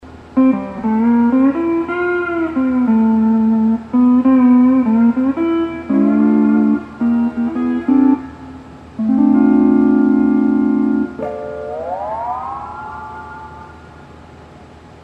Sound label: hawaiian piece